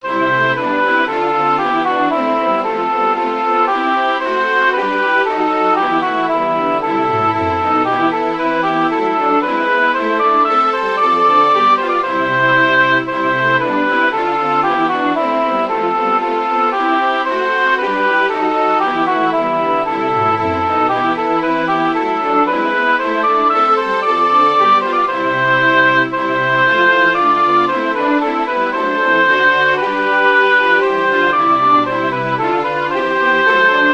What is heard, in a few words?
chorale for oboe strings; bach johann sebastian; ach herr lass dein lieb engelein